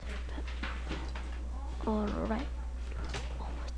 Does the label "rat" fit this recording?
Yes